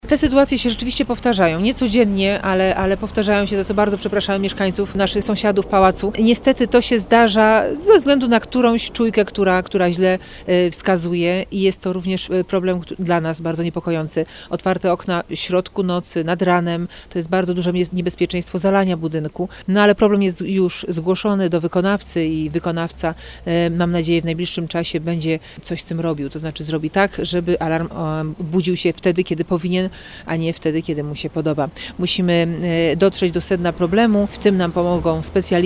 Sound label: alarm; palac